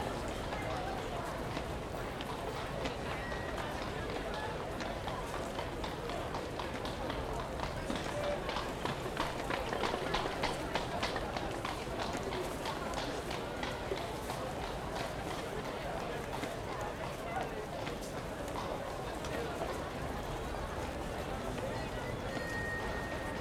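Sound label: London street exterior evening; the 39 steps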